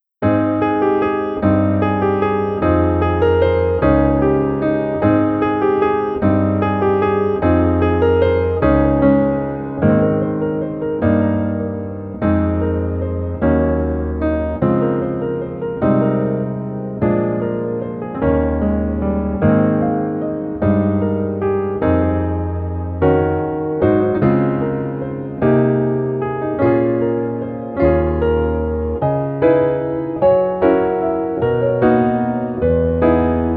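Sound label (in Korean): Piano version 멜로디 MR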